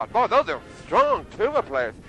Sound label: strong tubas